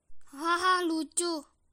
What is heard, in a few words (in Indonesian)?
haha lucu